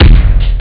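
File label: bass drum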